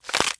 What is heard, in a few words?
BREAK Hollow; stereo; Universal Sound FX; BREAKS & SNAPS